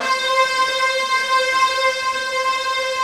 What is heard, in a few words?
big disto; East West - Global Sounds